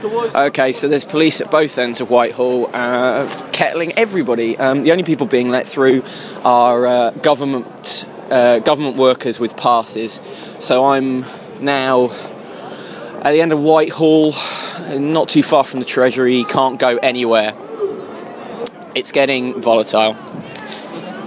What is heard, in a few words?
kettle on whitehall